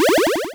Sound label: land; scrapeboard